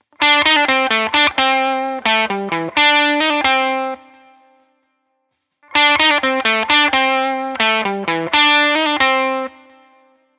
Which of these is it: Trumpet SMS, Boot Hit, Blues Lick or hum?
Blues Lick